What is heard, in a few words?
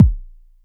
BASE, DRUMS PERC; Q Up Arts; Dream Experience